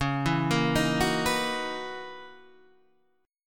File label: Gb 13th chord shape